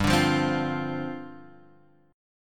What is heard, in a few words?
D-Minor 7th, G